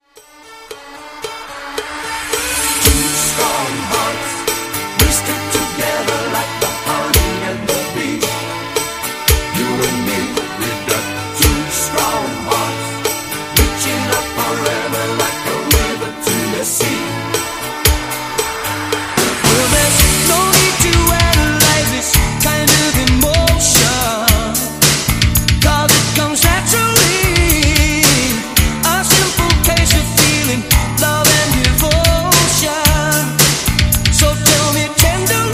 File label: redrum 112 bpm A